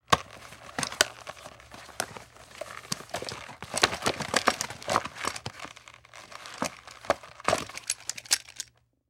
Household Desk Rummage Through Papers

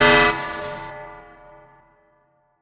Transition Hit Impact Metal; Adobe; Production Element

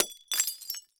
Crash Glass Break Beer Bottle; crashes; AdobeCC

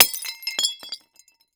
Crash Glass Bottle Break On Cement With Rocking; crashes; AdobeCC

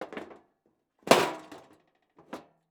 Sports Grill Metal Panel Sliding Into Grill

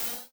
Multimedia Internet CD-Rom Flash Pop Up Short

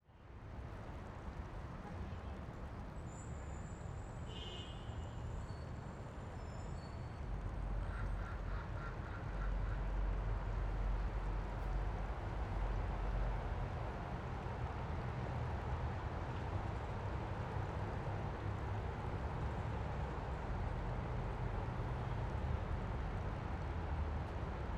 Ambience River Between City And Country; AdobeCC

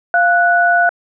dtmf tone 3

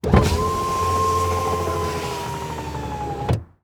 Truck Ford Ranger Roll Down Window Interior; transportation

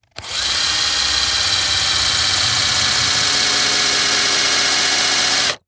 Industry Tool Power Drill Drills Into Wood

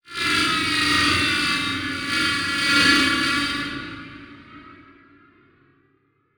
Transition Whoosh Multiple

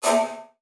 Multimedia Internet CD-Rom Flash Ding

